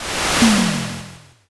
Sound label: fire magic